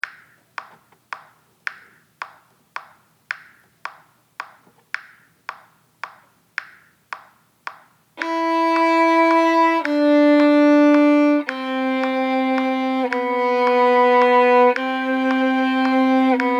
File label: Ukrainian Bell Carol